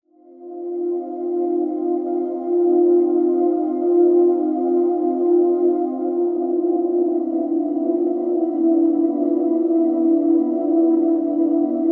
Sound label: ambient music; Magic GameClient